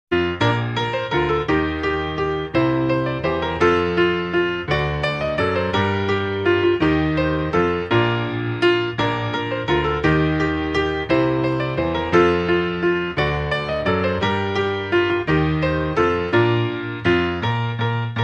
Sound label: We Wish You A Merry Christmas, Solo Piano